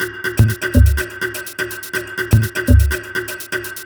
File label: Top G 124bpm, House Drumloops; 90 s Sample CDs; Zero-G House Fabrik Drumloops; ZG Drumloops